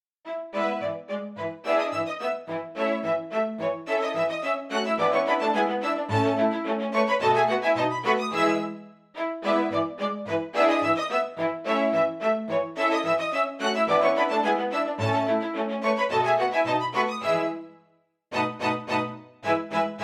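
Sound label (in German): Contretanze fur zwei Violinen und Bass; Zwolf Contretanze; Tanz II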